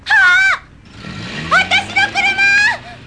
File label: car; Bubblegum Crisis; linna